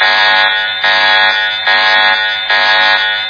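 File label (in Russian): непрерывный жужжащий звук сирены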